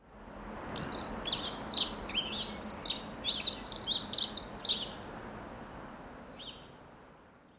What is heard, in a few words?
dunnock prunella modularis; nfused with that of the robin, but it is at a slightly lower pitch, scratchier and faster; Graham Wallis; Xeno-canto